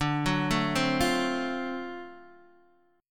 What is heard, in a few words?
D 7th Sharp 9th, Guitar, DADGAD